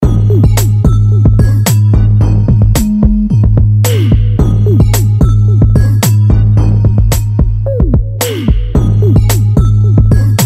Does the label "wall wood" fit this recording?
No